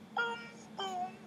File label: booomboooooom